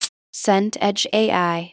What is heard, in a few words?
shaker hit